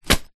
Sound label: tent packed into bag down on ground; foley